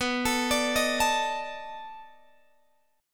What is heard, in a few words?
B 7th Sharp 9th, down, Guitar, Open G